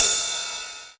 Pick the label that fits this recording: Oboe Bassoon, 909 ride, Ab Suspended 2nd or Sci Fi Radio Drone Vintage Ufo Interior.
909 ride